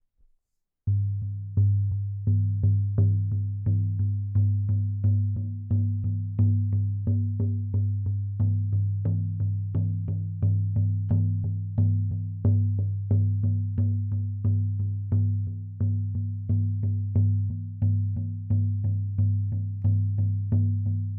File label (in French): 40cm, tambour chamanique en peau de vache sur cadre en bois; bois de peuplier